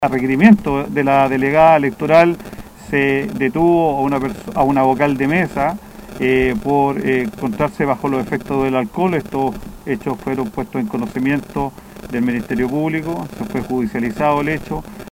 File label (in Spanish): vocal ebria